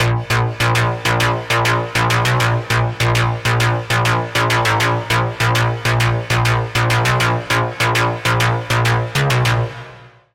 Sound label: Lead; Routed Sound; Spire Expansion - Doom Carpenter Vol.1